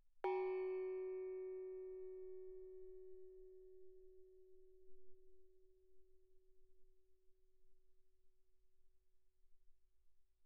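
thai gong Gb4 mezzo forte, Percussion gongs & tamtams; thaigongs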